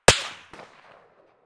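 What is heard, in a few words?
concrete distant; Escape from Tarkov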